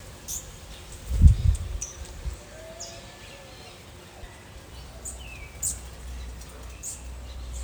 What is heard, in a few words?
ruby topaz hummingbird